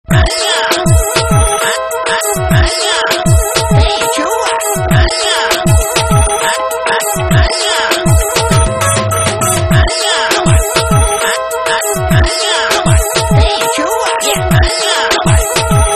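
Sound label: granny rap duduk; babka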